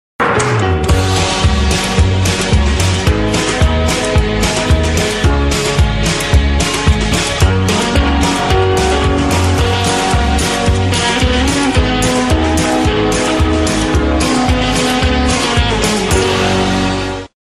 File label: Good Mood Music